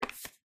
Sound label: throw